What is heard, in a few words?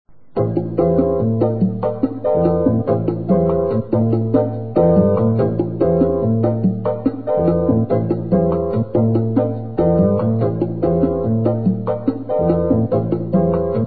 6 8 Guiro; Piano diary 2000